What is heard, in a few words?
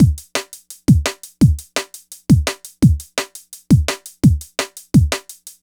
DRM; Best Service ProSamples vol.03 - Drum & Bass 1; AKAI